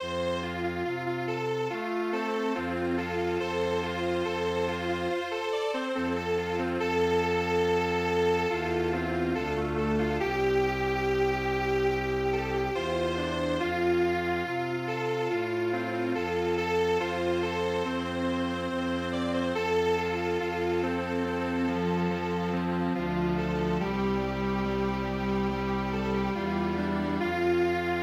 strings; Camp Night

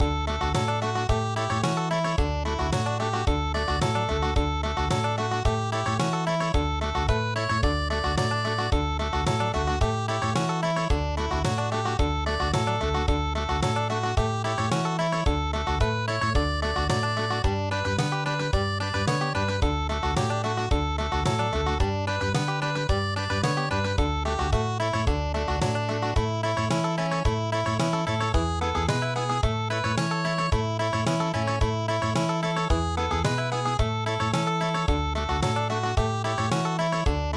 cheerful harvest Country

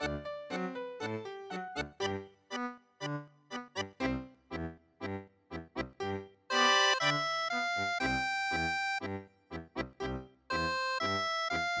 saints marching tango

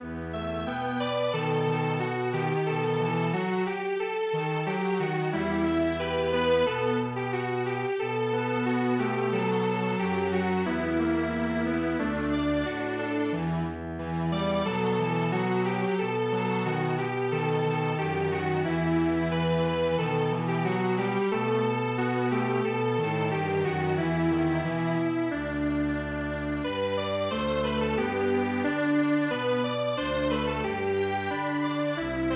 Strings Quartet

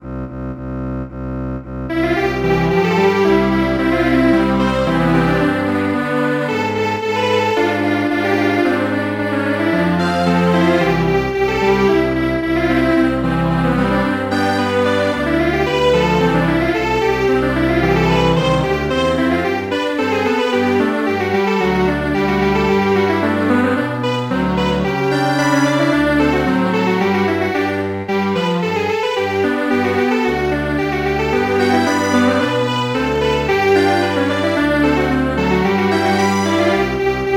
Schottish Strings Quartet; midi; traditional

